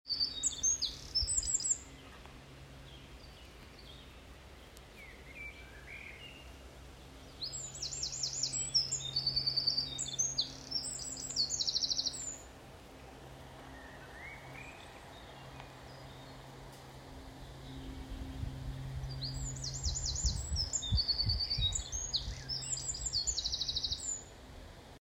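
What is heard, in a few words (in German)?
Zaunkönig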